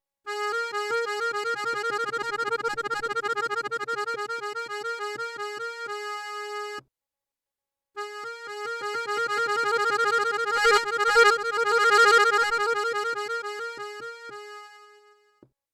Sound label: trill another sample